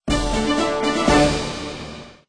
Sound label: Game Win Ident; Super Jumpy Ball; PS4